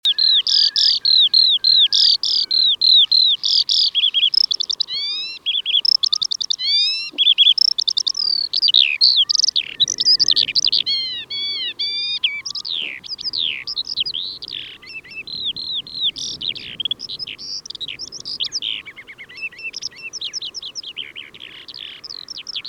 Eurasian Skylark Alauda arvensis

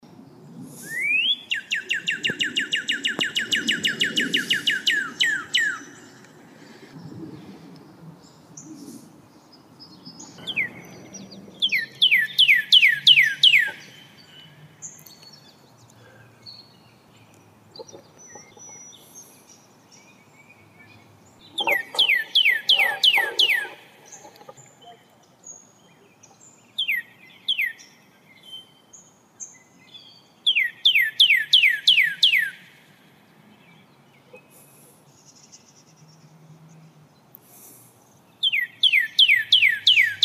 Northern Cardinal Cardinalis cardinalis

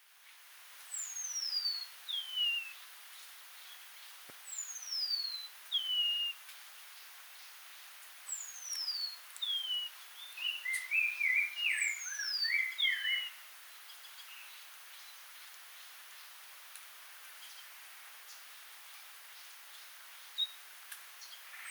Lavender Waxbill, Wassadou

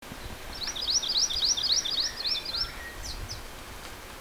Anianiau

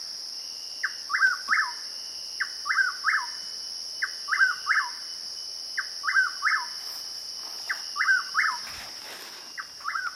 Chuck Wills Widow; Lk Kissimmee SP; iphone

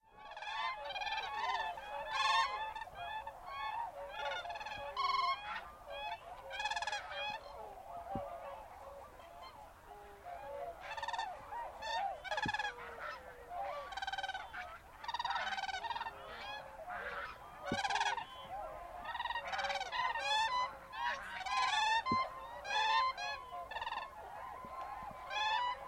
Siberian Crane Leucogeranus leucogeranus; Koel Ko

